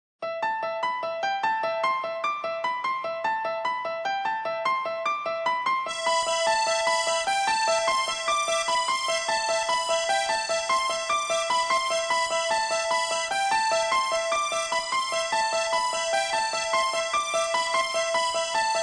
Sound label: tubular bells